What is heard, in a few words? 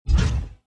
build; star-rebellion